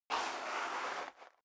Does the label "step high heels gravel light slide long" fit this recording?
Yes